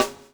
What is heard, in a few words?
SLICK, Backbeat